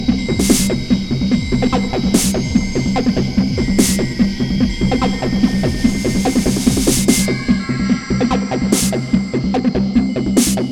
Acid Jump

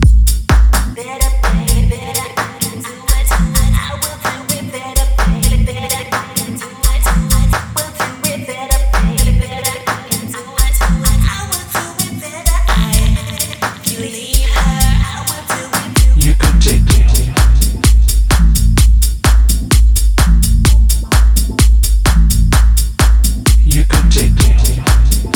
Big Basses

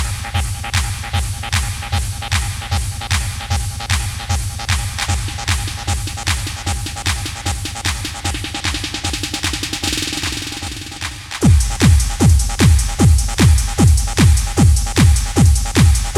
Tech Beat

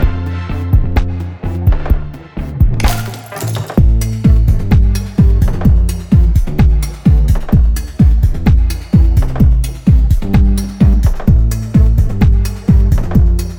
String Mashine

